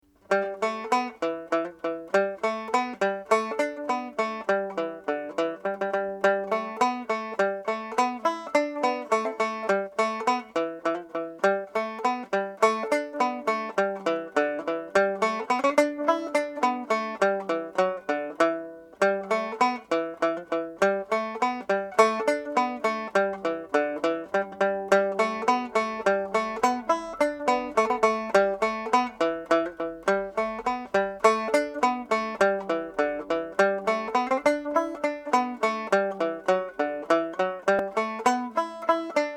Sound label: palmers gate banjo